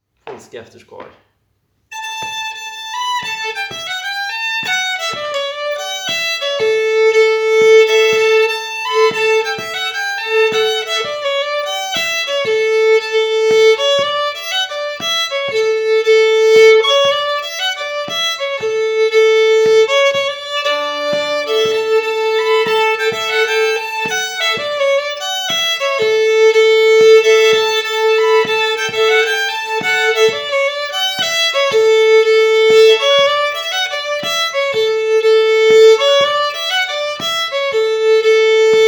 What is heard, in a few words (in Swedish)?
Polska, Svanskog; Polska efter Johan Skar